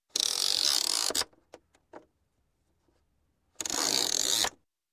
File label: Toy Winding Toy; Sound Ideas 2000 Series